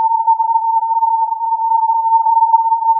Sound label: filtered input